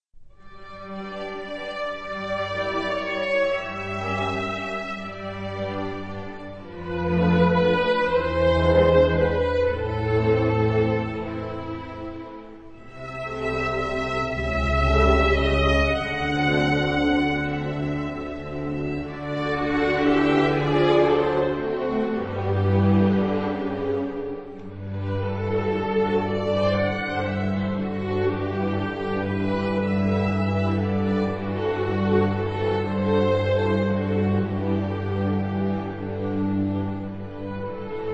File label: Organ Grinder